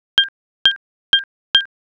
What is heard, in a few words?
drip